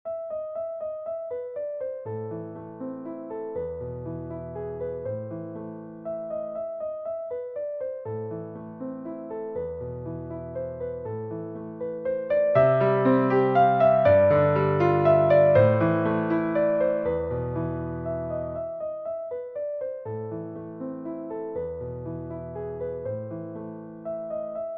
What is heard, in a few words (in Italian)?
piano; per elisa